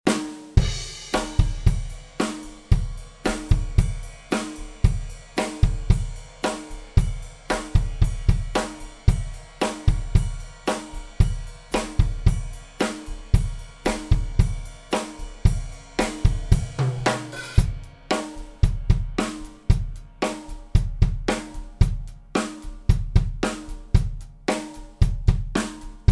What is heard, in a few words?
Drums Stereo 8 Tracks DAW Summed